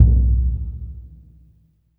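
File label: Burundi drum, Heart of Africa; Spectrasonics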